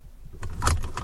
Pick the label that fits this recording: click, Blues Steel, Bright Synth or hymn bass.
click